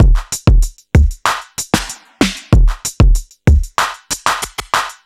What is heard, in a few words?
Electro Drums 95; musicradar